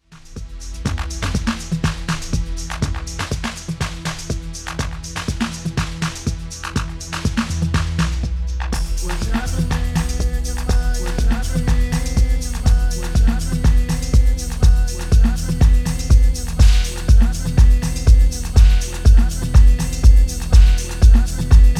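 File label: 3200 MS Feedback Dry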